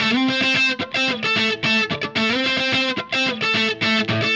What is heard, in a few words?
Guitar, Indie Rock; Sonic Foundry (Sony Creative Software); Indie Rock Anthems; Session 01 - Brighton